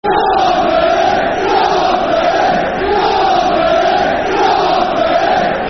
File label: Come On Bury, Football Chant; Bury Bfc